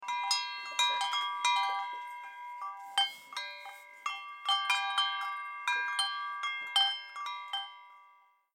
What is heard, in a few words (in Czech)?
pavouk; botanicula